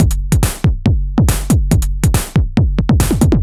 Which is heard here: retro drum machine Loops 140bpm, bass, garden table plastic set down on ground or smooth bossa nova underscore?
retro drum machine Loops 140bpm